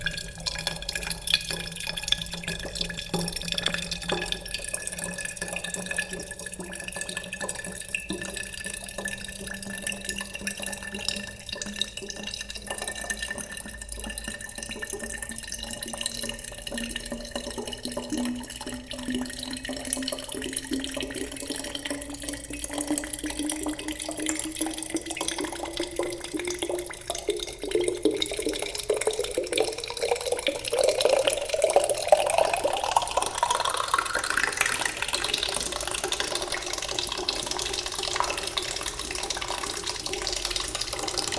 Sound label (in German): Wasser in die Flasche